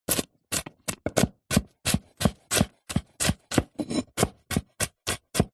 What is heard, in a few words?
carrot slices dice cut chop fast with knife on wooden chopping board; food; zapsplat